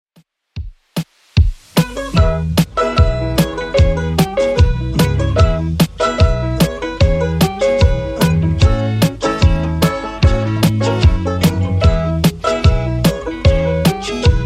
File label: 70s Redrum 149bpm